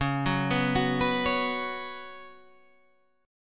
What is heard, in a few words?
F sharp Suspended 4th, C sharp, guitar chord